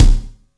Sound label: crispy kick C key; Duration 0:01; Mono